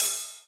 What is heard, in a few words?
EDM Future Ride, Cymbals; Big EDM; Future House Tools